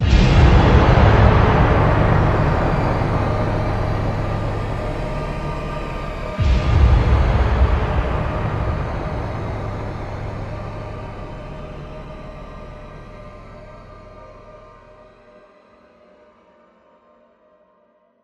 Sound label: Impact; at dead of night windows gamerip 2020